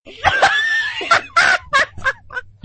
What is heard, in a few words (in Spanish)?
risa